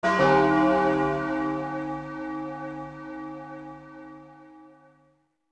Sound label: Bell Toll; ZeldaSounds